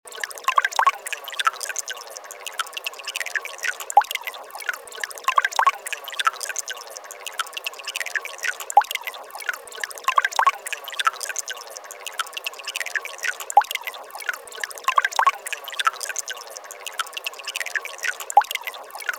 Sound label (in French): Sonnerie gouttes eau matin